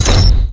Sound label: slam met, man